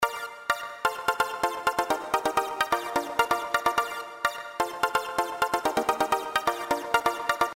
BackBeat

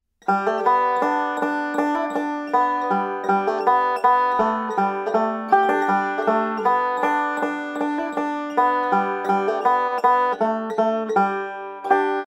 Banjo Solo add 5-string

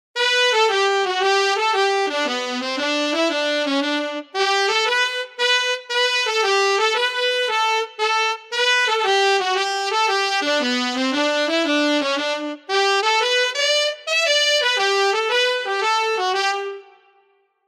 AT sax

